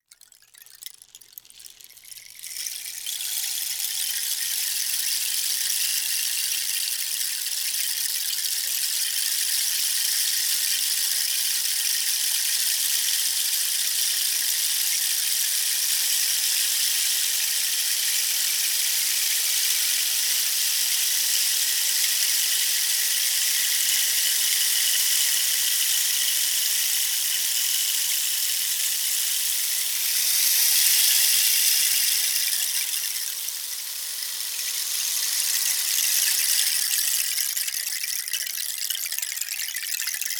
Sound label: crackles